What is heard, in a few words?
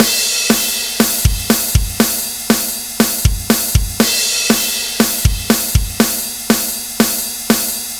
metal drum loop; mu-metal; hardware